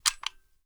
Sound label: m24 load, sniper; weapons; garrysmod